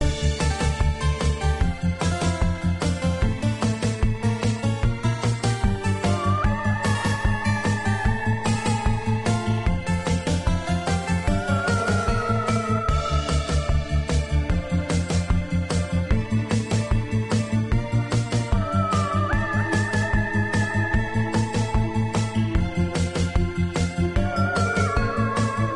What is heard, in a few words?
music main menu; Bunnicula - Juicy Bites